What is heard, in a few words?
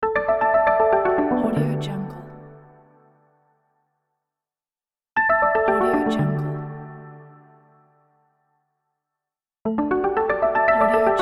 arpeggio logo